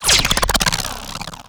bolt huge zap

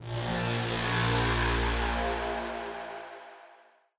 cable pulse, music; c17; citadel

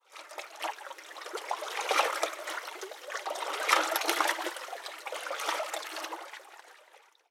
ambience canals water bridge

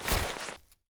snowball throw; winter; Counter Strike Model Pack; CS:GO

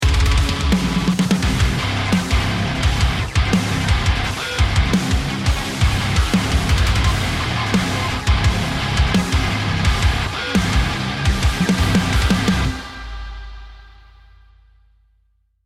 start action, music

